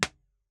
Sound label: Blaupunkt tube radio, IR, Speakers and telephones